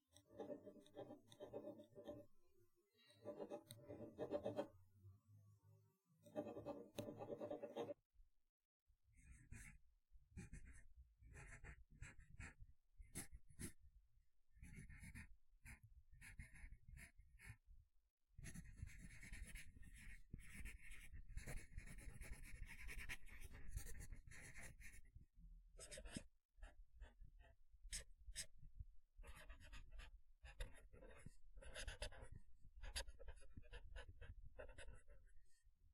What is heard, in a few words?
Writing On Paper